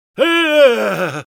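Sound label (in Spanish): Engineer pain critical death, es; *Grito de muerte 3*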